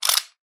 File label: coins handling; soundscrate